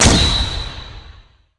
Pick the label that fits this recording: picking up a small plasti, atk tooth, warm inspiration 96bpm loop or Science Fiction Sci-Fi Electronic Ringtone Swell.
atk tooth